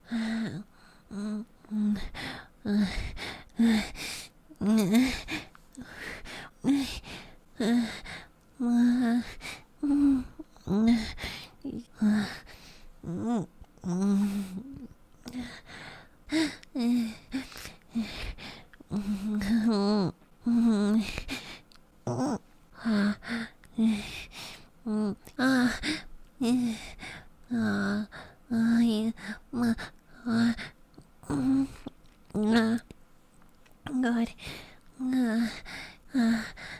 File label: Femboy Noises